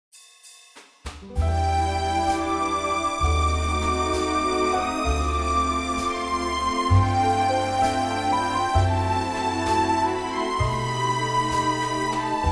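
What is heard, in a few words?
orchestra C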